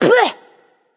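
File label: clear throat, female; smite; voicepack; hera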